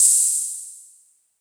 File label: openhat; drumkits; openhats; tana openhat; fl studio